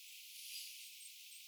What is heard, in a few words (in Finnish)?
mika laji tuo yksittainen pieni aani